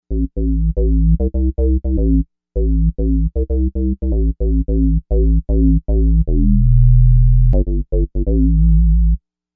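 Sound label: Simple Square Bass; Novation UltraNova; SuperNova Soundpack Volume 2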